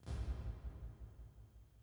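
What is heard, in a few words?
stereo kick, hi-tech kit; Crypton - DrumBase5000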